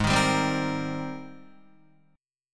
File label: Ab Major 9th Ab down Guitar 4E 4A 5D 5G 5C 6F; AbM9 strummed; JGuitar